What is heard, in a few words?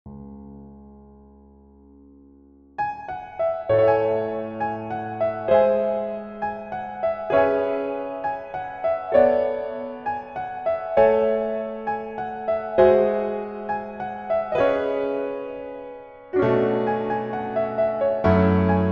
Piano